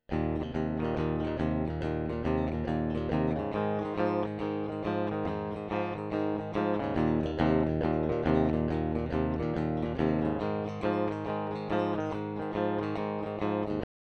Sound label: Clean Combo